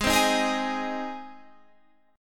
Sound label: G sharp Major G sharp Guitar Standard, chord; strummed; JGuitar